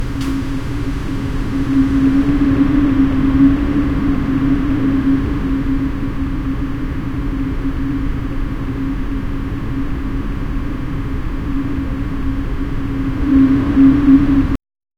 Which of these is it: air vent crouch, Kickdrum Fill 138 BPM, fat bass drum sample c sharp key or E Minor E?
air vent crouch